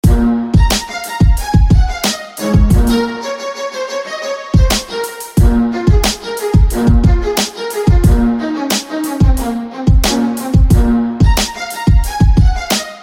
Staccato; Glory Staccato

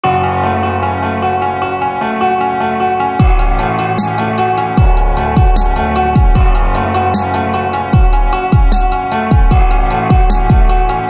Chaos Keys

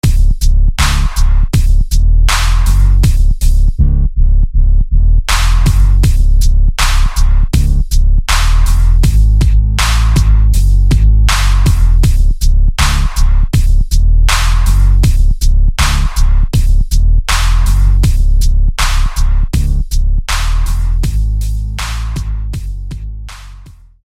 Electrum Bass

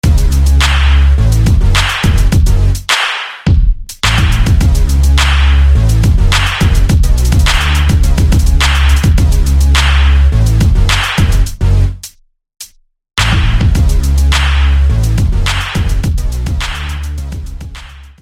Angry Bass; SFB FX

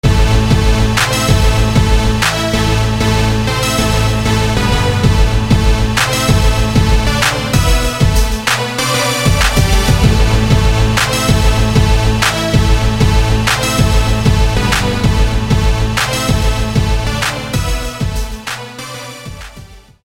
Synth; Indigo; SFB FX

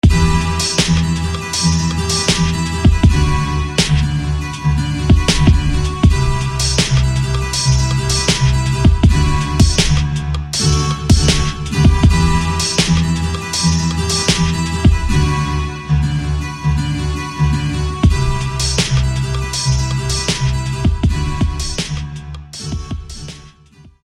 Guitar; Staged; SFB FX

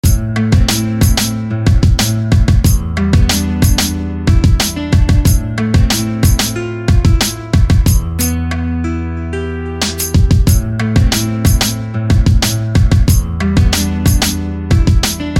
Fire Guitar

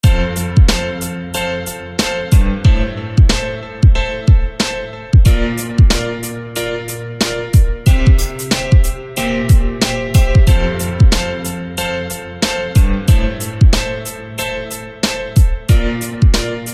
Mindburn Piano; SFB FX

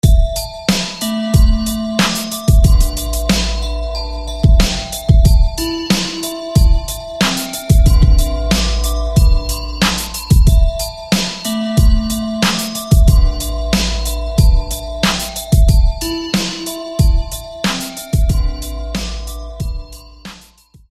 Metaloglock; SFB FX

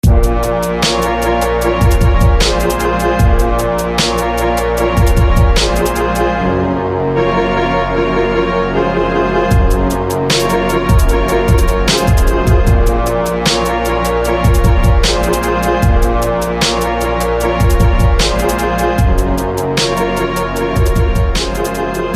Dragon fire Horn

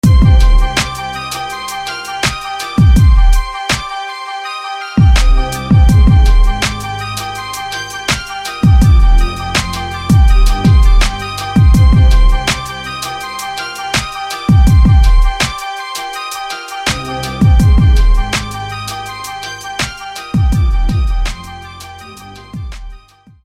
Fluted Strike

